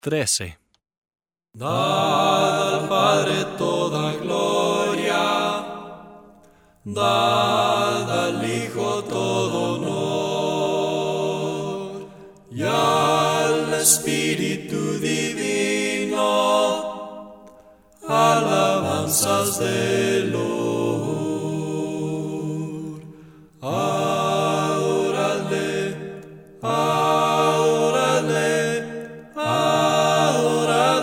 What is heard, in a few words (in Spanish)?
Palabras cantadas sin instrumentos